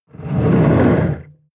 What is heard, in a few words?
metal roll